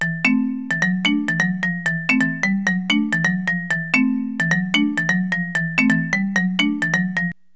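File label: marimba; Roland; An Invitation To The Roland World Of Music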